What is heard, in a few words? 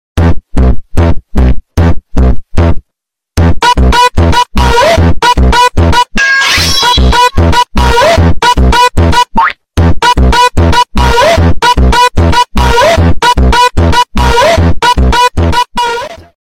augh funk